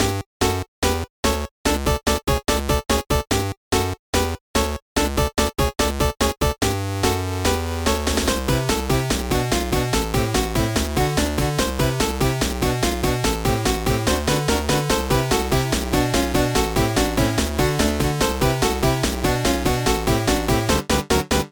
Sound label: 8 Bit Game Theme